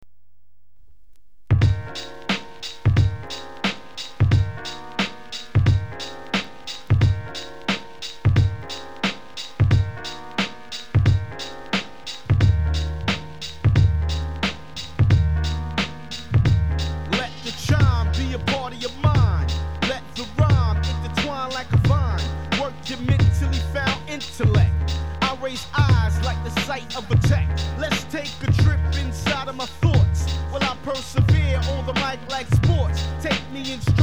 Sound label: hiphop